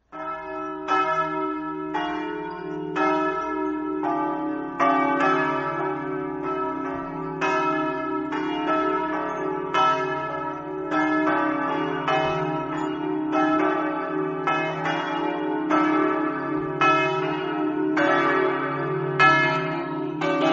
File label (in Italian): amaro, campane